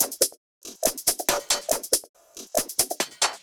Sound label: drum Loop 140BPM Perc; Vengeance - Essential Clubsounds Vol.5; VEC5 Drumloops; VEC5 Multi Loops 140BPM